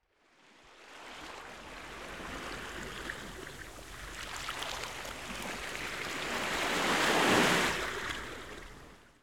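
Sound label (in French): mer, sea; MOBICLIC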